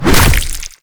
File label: Infested Ancient Tentacle Swing Hit; Warframe